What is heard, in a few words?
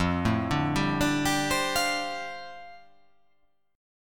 F 6th Add 9th F down, chord